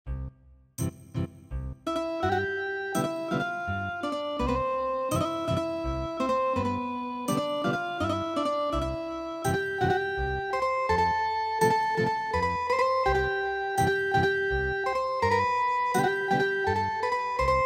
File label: Ethnic Guitar